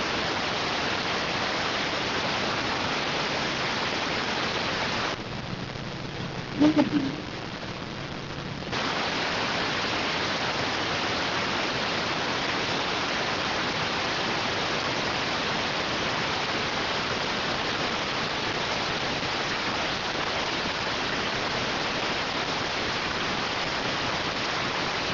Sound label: rtty rx